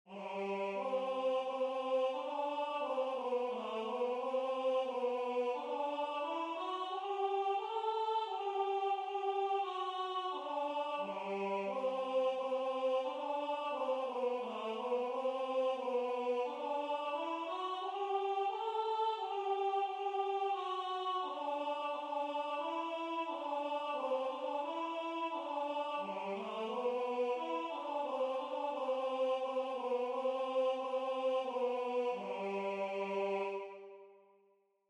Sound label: Alto